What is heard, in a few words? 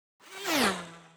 Sci Fi Ultra High; Whooshes